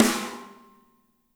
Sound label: MED SNR; SNARES; Spectrasonics - Burning Grooves CD2